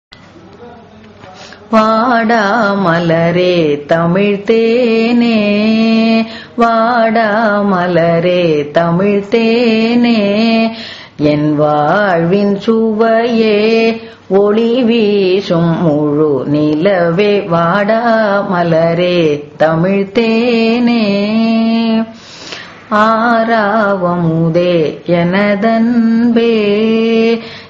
raga mukhari cine; carnatic music

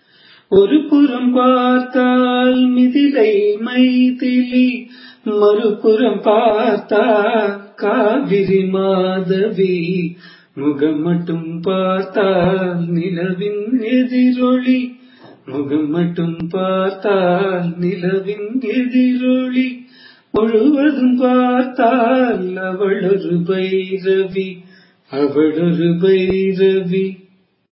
raga bhairavi, carnatic music

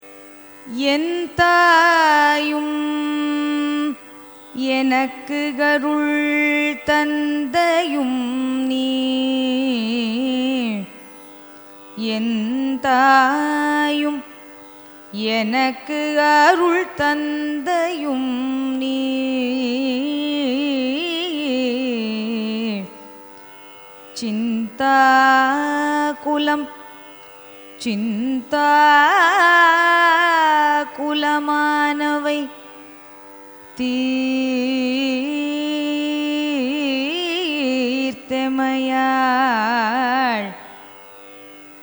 carnatic song surutti adi; kandar anubuthi; arunagirinathar

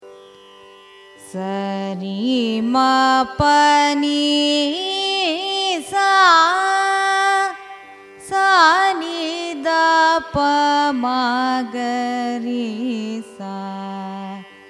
raga kedara gowlai arohanam avarohanam; carnatic music

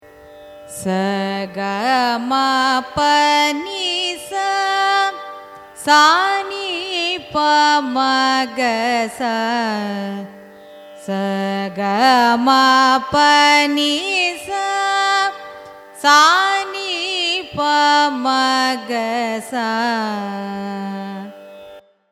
raga suddha dhanyasi arohanam avarohanam; carnatic music

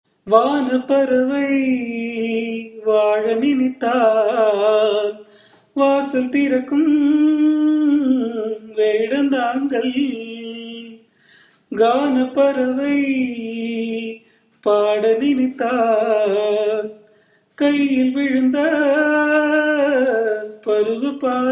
raga chakravakam cine, carnatic music

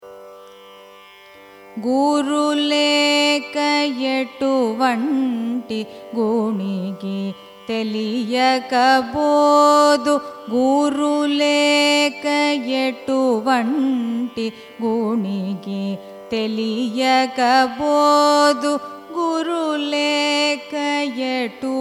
raga gowri manohari carnatic; guruleka etuvanti

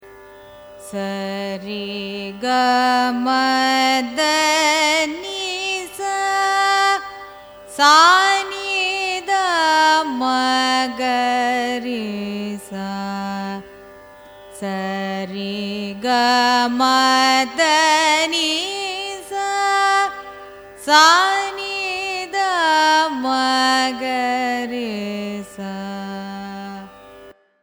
raga lalitha arohanam avarohanam; carnatic music

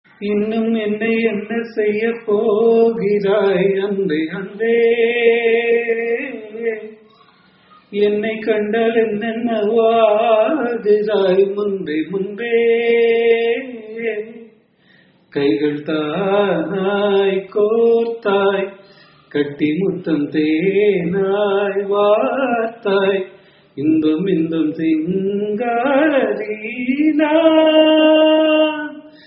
raga gambheera nattai cine, carnatic music

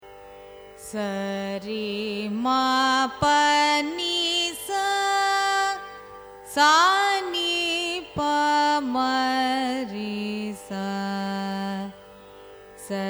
raga revati arohanam avarohanam; carnatic music

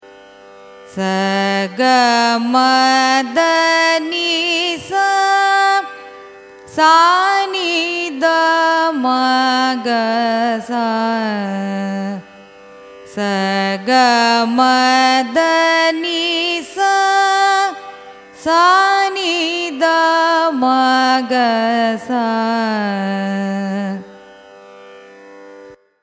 raga varamu arohanam avarohanam; carnatic music

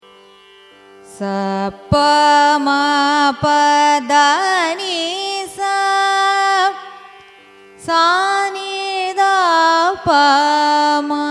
raga hamir kalyani arohanam avarohanam; carnatic music

